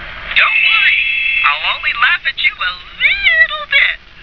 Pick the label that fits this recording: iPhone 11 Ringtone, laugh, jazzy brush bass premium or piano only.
laugh